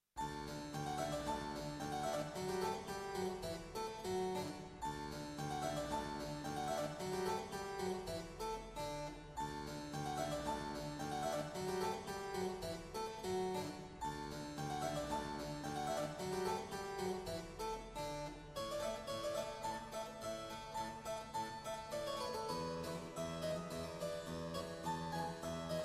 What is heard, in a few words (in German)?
Cembali; DS30; Bach Musette